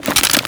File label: Grenade Pickup; Grineer; Pickups; Weapons